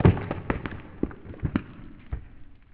rocks; The Elder Scrolls III - Morrowind

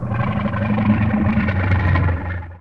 moan; The Elder Scrolls III - Morrowind

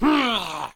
Goblin Injury; SyrinScape

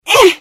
Hit; The Elder Scrolls III - Morrowind

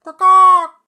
Ka Kah bird; SyrinScape; Syrinscape unplugged; Jungleplanet dinolaser battle

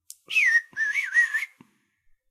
Whistler bird

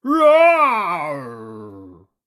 dino roar

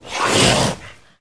roar; The Elder Scrolls III - Morrowind

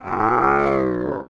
moan; The Elder Scrolls III - Morrowind; nix

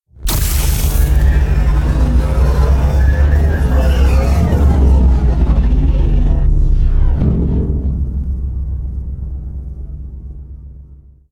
Force blast trap; SyrinScape; Against the cult

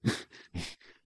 Run Grunts; SyrinScape; City gone mad

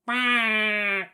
Fark bird

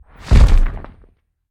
Dragon Wing Flap Big; SyrinScape; Clockwork entities

